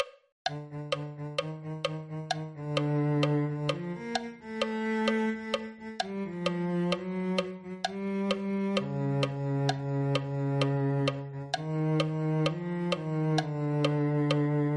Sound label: cello